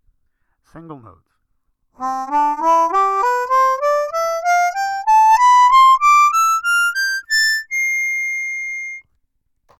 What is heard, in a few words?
Single Notes